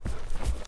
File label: step